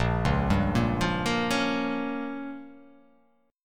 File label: A sharp Minor 6th A sharp down, chord